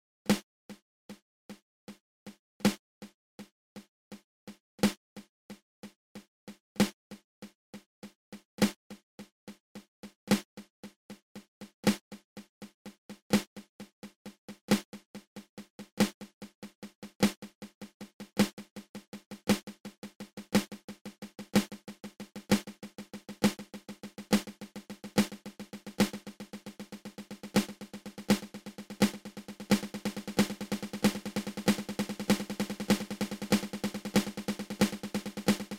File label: Flam Paradiddle diddle open close open